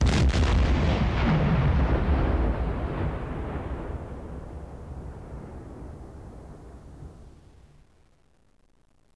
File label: explode, Ship's Gun Sounds; Audacity